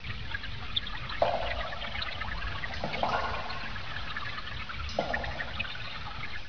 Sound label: drippy